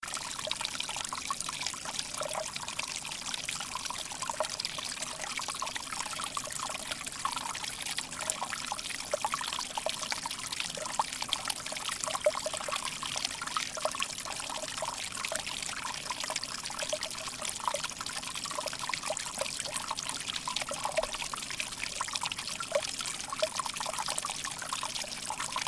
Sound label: water creek sound